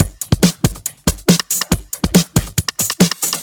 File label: Breakbeat; Vengeance Samples; VEC2 Loops